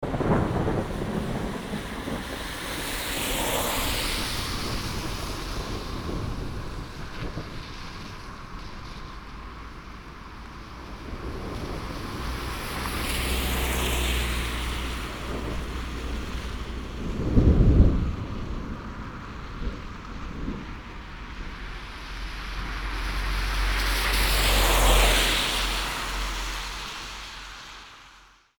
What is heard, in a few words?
thunder car; HradecNadMoravici; field